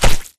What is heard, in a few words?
Mvmt FS Jog Mud Layer; AI Stalker; Titanfall 2